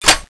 charger clip out

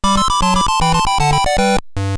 gameover; czero

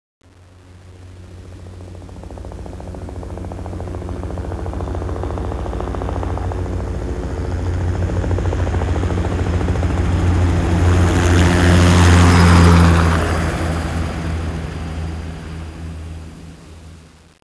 chopper; czero; de kabul cz

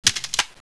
scout clip in, weapons; cstrike

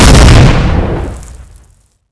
explode

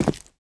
player step; csgom; cstrike

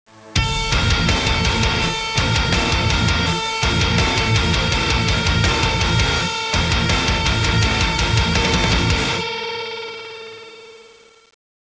round ten sec count; csgom; cstrike

